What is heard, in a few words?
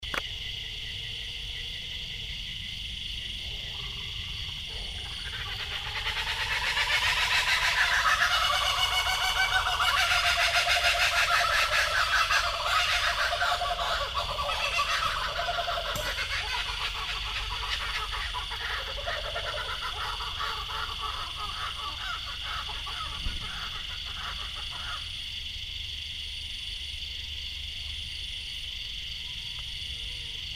Kookaburra Laughing